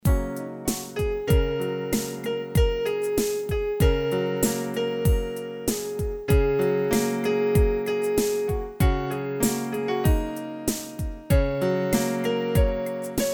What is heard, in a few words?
Instrumental Ringtone